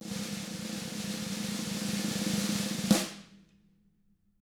roll, snare; ILIO; Double Platinum Drums 1

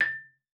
marimba A6; Xsample VOL-4

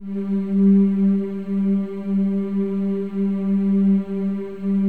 G3, CHOIR; Spectrasonics; Symphony of Voices

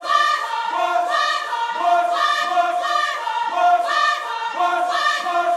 CIR WASH, Vocal Gospel CIRCLE LPS

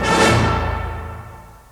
ORCH, ORCHESTRA HIT; Crypton - DrumBase5000

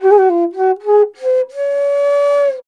INDIA ALTO, INDIAN SET; Spectrasonics; Heart of Asia

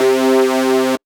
C Synth, One Shots; Electronisounds; Vibration Expedition

